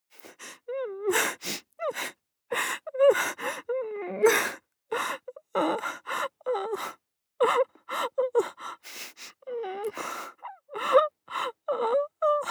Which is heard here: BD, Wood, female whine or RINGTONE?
female whine